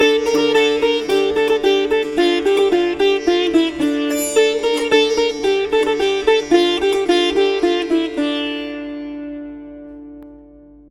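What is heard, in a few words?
Sitar D minor 110, Indian Sitar; Indian Sitar Vol. 2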